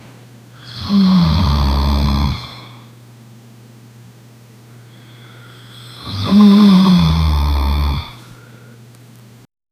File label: 20 year old man, sleep